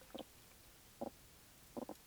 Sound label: Opsanus tau toadfish, soniferous; Cotuit